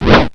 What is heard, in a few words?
Flamer; RpgMaker